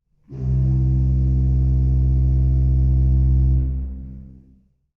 Pfeifen 16', organ

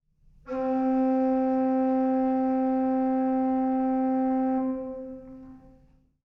Pfeifen 4', organ

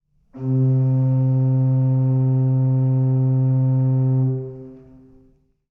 Pfeifen 8', organ

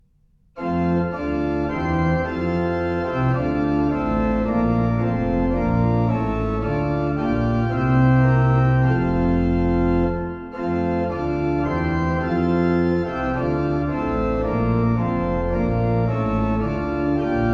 Prinzipalchor, organ

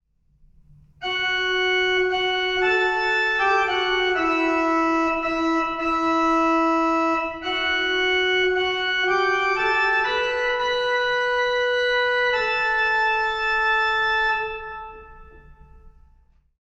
Register 5fach, organ

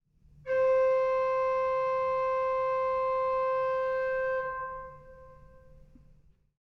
Pfeifen 2', organ

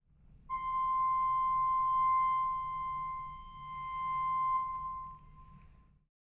Pfeifen 1', organ

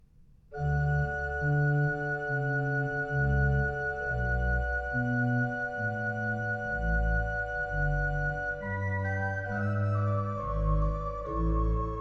Leise Soloregistrierung, organ

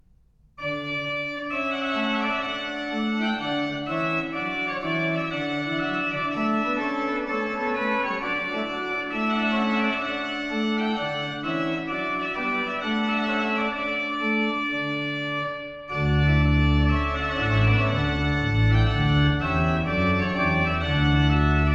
Kräftige Soloregistrierung, organ

